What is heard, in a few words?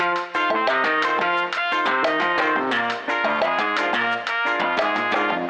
Muzak